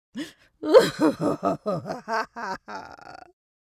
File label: Witch fantasy vocalization crying